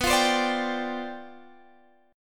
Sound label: G 11th B